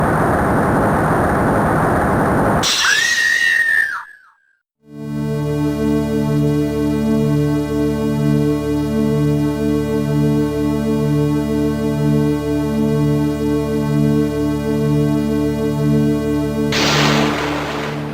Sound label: breakdown; dahlia